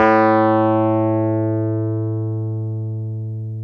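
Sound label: piano, Wurly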